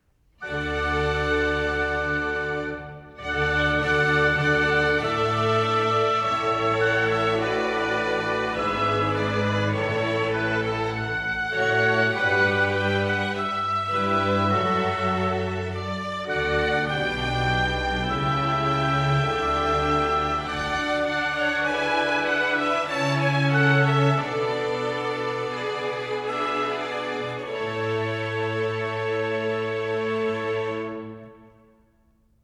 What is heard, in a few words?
Trumpet Concerto for trumpet, strings & continuo in D major, Grave; TWV 51: D7; Trumpet Concertos